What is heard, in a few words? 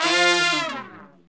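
brass section rock and roll falls; Roland LCDP06 Brass Sections; Section FX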